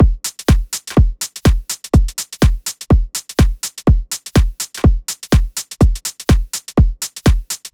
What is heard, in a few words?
House Drum Loop 124 BPM; Dance; 99Sounds Music Loops; 99Sounds x Monosounds